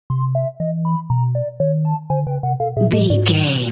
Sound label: objective failed video game stinger